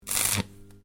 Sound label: Welding Arc Short, Arc welder; Welders; Machines; SoundEffects-Foundation4